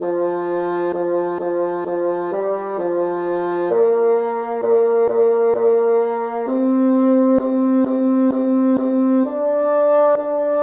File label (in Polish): tenor ludu kaplanski